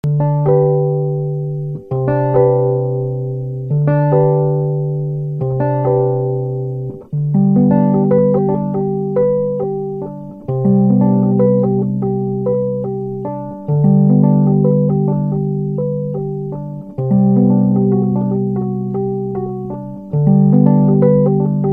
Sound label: blind loop; music